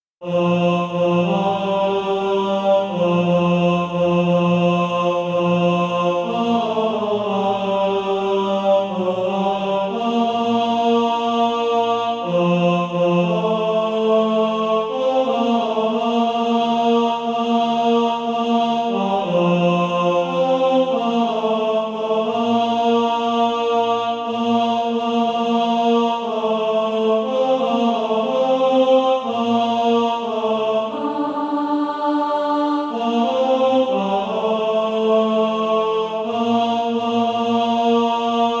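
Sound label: tenor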